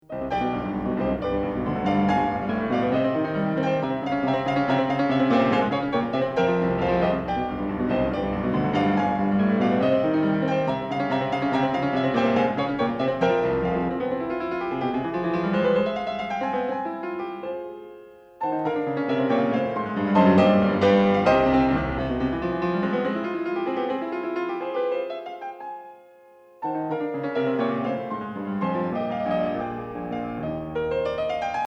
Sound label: Variations For Piano